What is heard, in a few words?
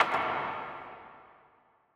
woodhit conv, reverb